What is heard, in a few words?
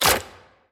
shoot; loki